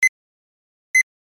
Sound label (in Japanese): pi